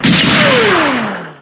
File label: beam rifle fire